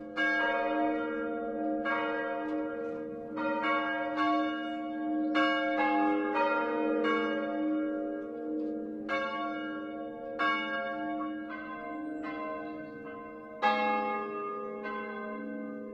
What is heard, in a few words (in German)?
kirche nachglocke; minecraft